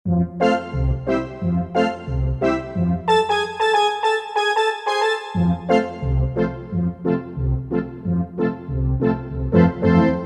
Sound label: Horn Brass, Roland JV-880; Factory Patches